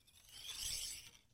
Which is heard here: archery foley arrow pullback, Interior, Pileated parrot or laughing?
archery foley arrow pullback